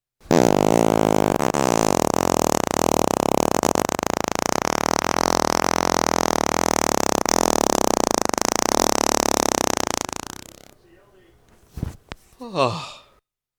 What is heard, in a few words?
fart